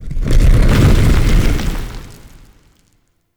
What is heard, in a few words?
Boulder Start, traps; Objects; Champion Crypt